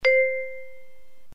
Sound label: bell